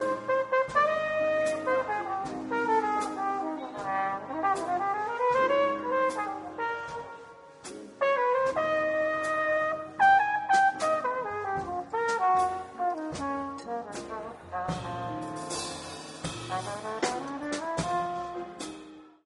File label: flugelhorn